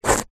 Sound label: carrot slice knife; zapsplat